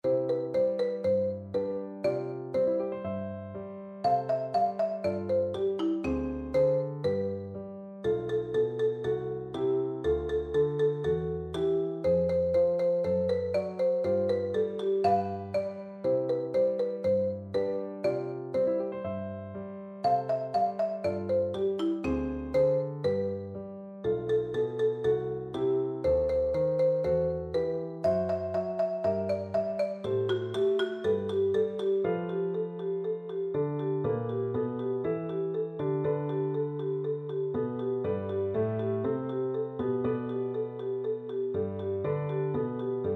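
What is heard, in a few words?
reed pipes marimba, percussion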